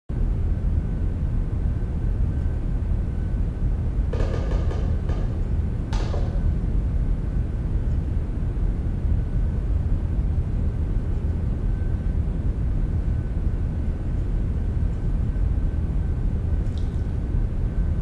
amb restoration, music; The Da Vinci Code; XBOX

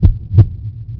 Heart Beat

